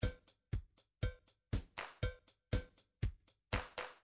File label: disco pop, midi